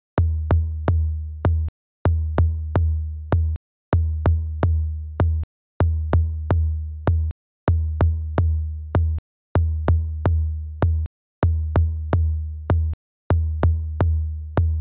tom, Key D#, Bpm 128, Big Room House; Loop Nerds; Big Room House Allstarz

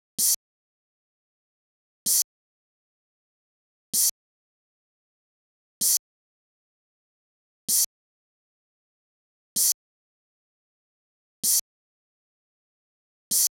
high hat open, Key D#, Bpm 128, Big Room House; Loop Nerds; Big Room House Allstarz

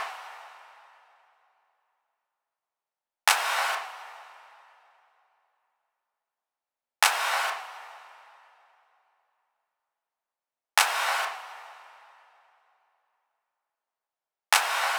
clap big, Key E Bpm 128; Loop Nerds; Big Room House Allstarz

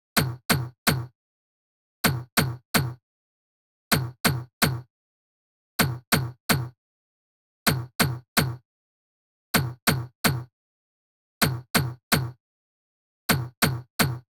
tom, Key D#, Bpm 128, Big Room House; Loop Nerds; Big Room House Allstarz

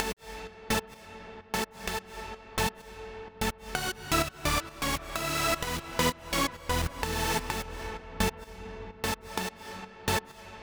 synth chords, Key A Bpm 128; Big Room House; Loop Nerds; Big Room House Allstarz

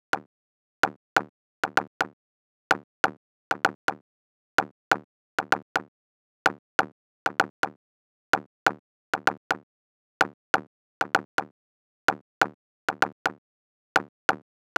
percussion, Key C#, Bpm 128, Big Room House; Loop Nerds; Big Room House Allstarz

